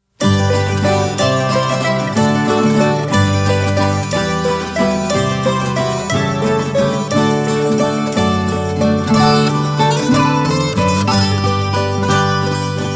Bailarico

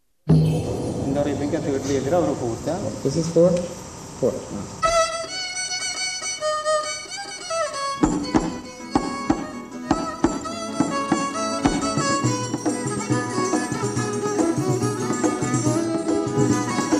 Cafrinha Instrumental

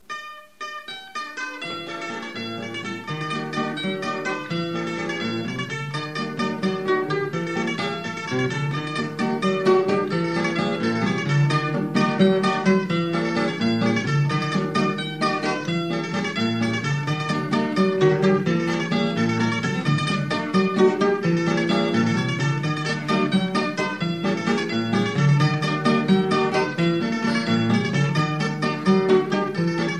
Fandango

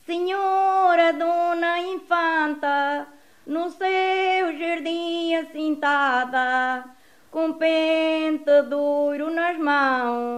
Romance

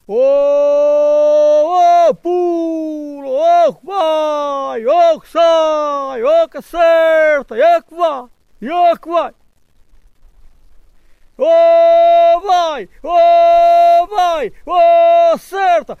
Cantar

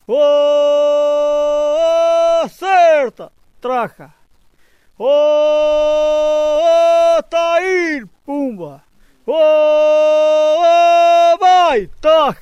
CAntar

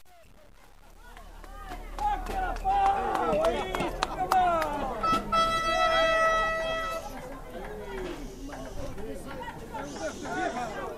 Contradança

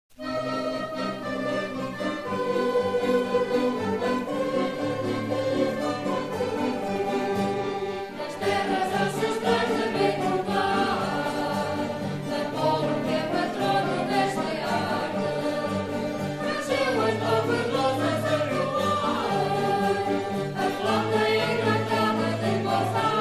Marcha da Tuna

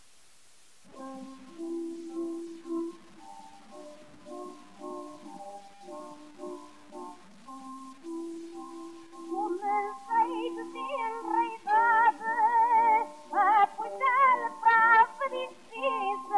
fado corridinho

